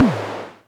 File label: Percussion Computer Tom; Atlantex MPC-1